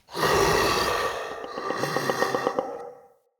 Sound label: Mockery Male Vocal Breath Light; Enemy; Mockeries